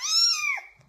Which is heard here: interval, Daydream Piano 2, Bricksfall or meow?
meow